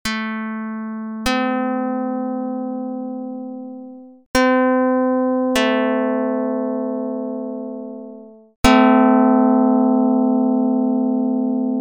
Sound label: pluck; dr220